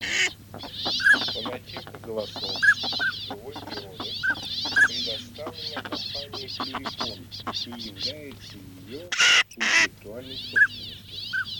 Шилохвость Codone; plurifon

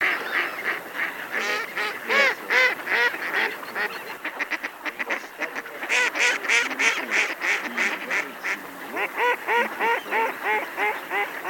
Кряква Germano; chip mini 234 plurifon

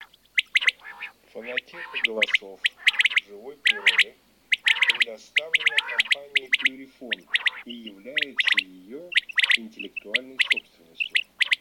Перепел Quaglia

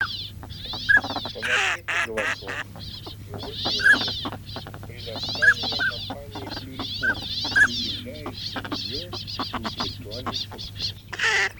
Шилохвость Codone

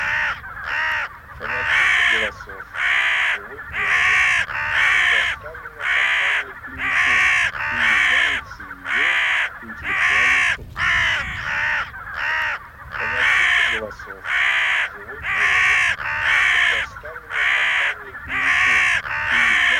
Черная Серая ворона Cornacchia

